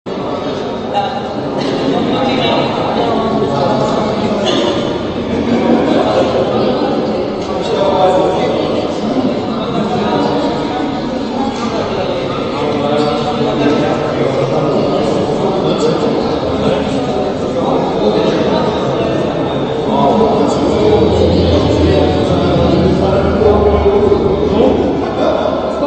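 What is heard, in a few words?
sound of crowd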